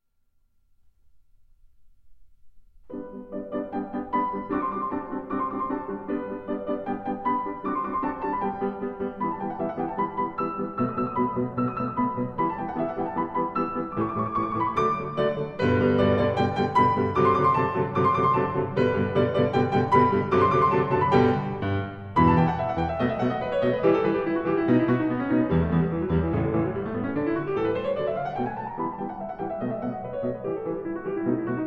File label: Rondo a capriccio in G for Piano; Op 129 Die Wut ber den verlornen Groschen